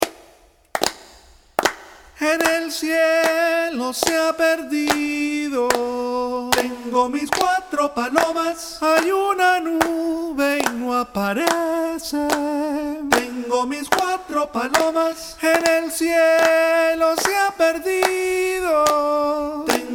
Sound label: cuatro palomas alto